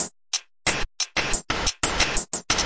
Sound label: 090 bpm glitch noise breaker, drum loops and breaks